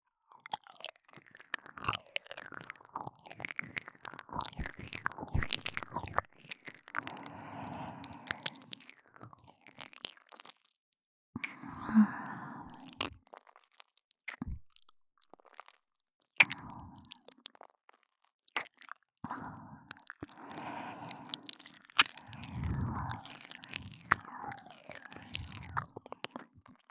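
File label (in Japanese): 耳舐め／左; イリス耳舐め5分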